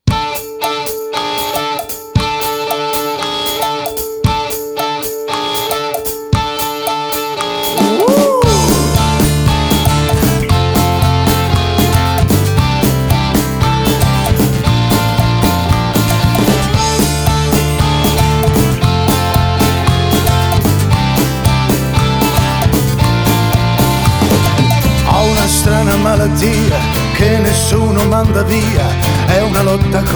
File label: Folk Rock